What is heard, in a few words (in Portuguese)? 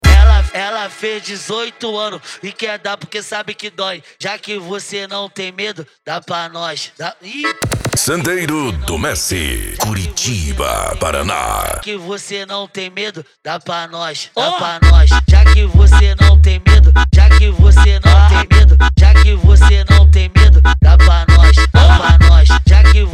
funk mega bass